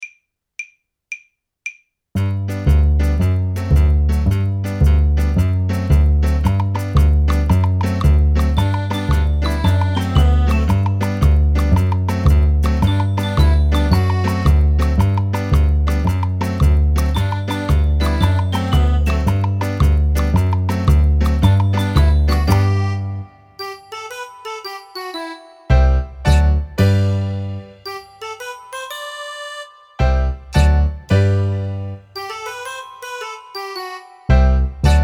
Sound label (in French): basson